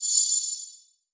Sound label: Science Fiction Magical Shimmer Sparkle High